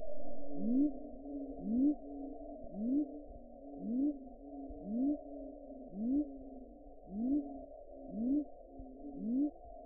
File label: Leptodactylus luctator; herpetologia; cantos